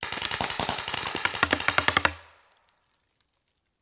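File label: Paintball Gun Impact; Sound Ideas; Guns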